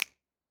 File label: Snap